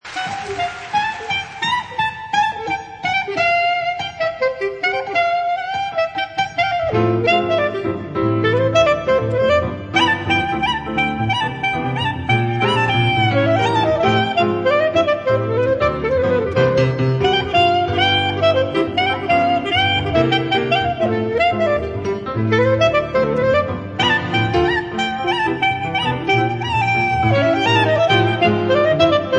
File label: gafieira jazz